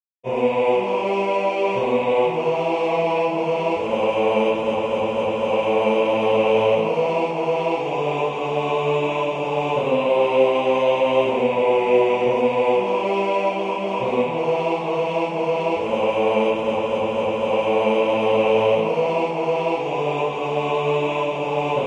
basse; Jesus nous croyons

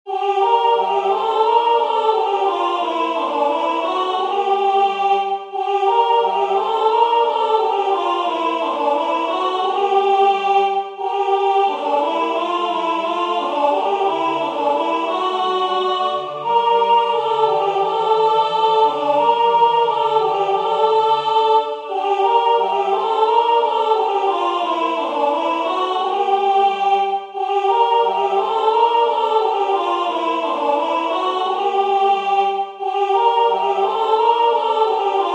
alto; Noel nouvelet

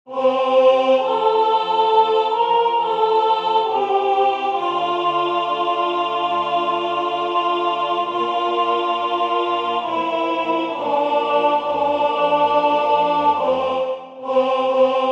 Je vous salue Marie soprane

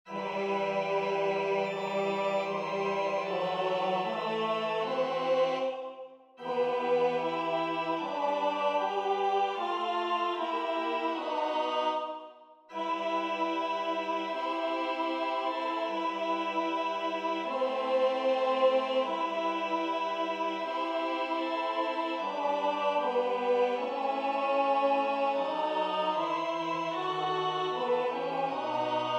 alto tenor; Ave Maria Franck

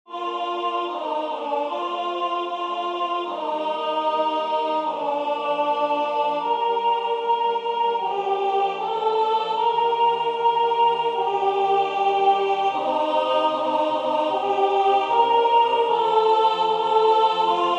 O vrai Corps de Jesus soprane; Du Sejour

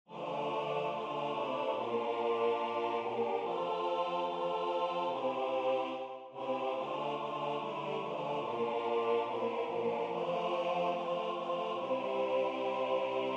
Ne crains pas soliste homme

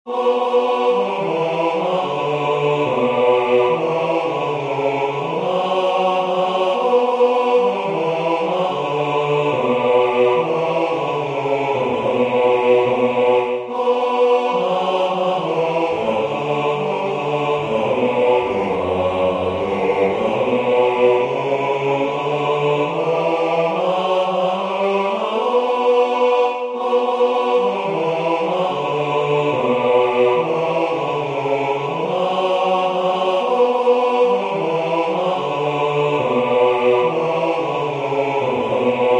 Le Christ est ne ce soir basse

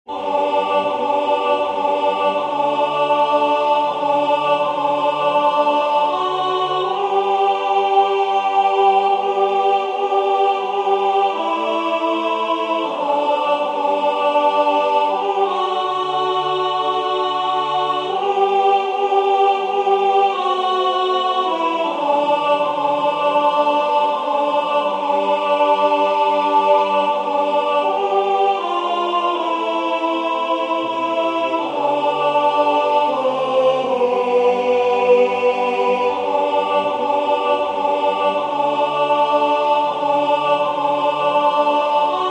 alto; O salutaris Hostia; Mozart

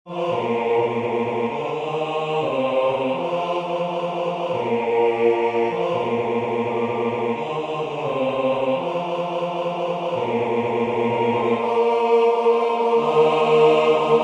Venez bergers et bergères basse

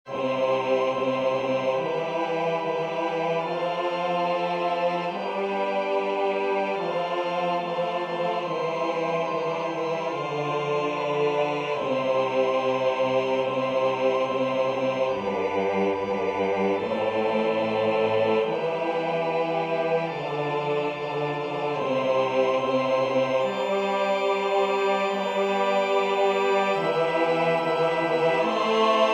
basse; Adoramus te Christe

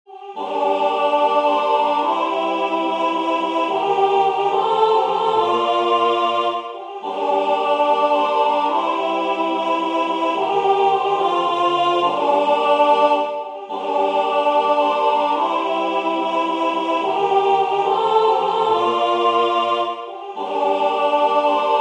Jai vu des fleuves deau vive alto

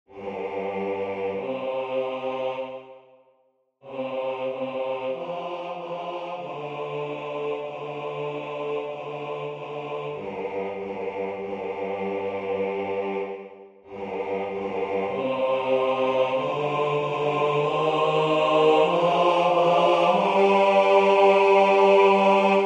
basse; Je ne suis pas digne

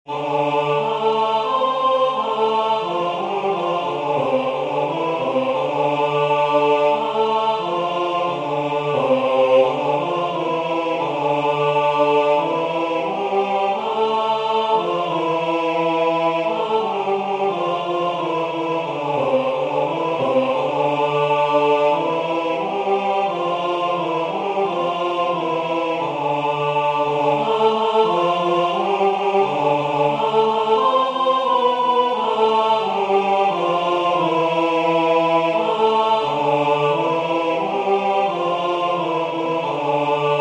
voix I haute; Jesu dulcis Mater

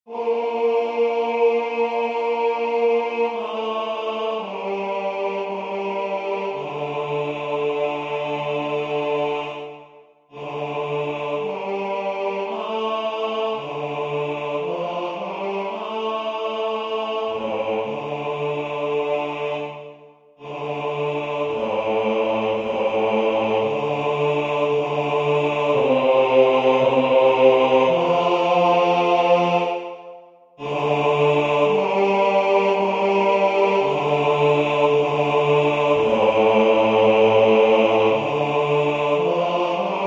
Iste est panis basse; Gauthier

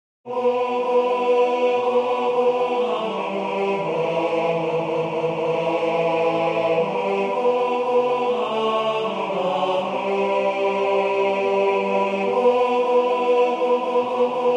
Jesus nous croyons tenor

